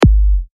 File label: Kick Slave